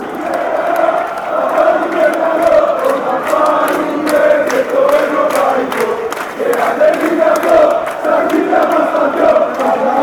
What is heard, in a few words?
chant; olympiacos